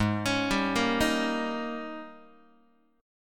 Ab Major 7th Suspended 2nd Suspended 4th Ab Guitar 4A,4E,5A,5D,5G sharp; arpeggiated; x,4,4,5,2,2; AbM7sus2sus4; JGuitar